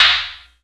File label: Sproink; 300 Drum Machines; E-MU MoPhatt